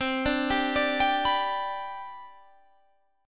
C Major 7th Suspended 2nd, C, down, Guitar, Half Step Down